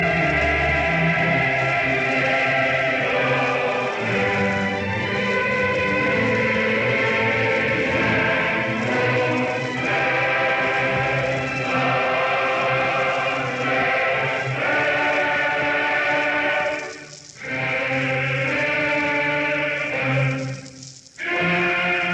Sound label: Symphony in D minor Choral Andante maestoso Adagio ma non troppo; Op 125